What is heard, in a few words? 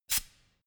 pneumatic pump short burst soft